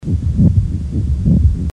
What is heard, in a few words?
rub